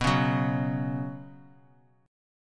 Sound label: B Suspended 2nd, Bsus2 chord; strummed; JGuitar